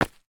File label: stone, steps; VoxelEngine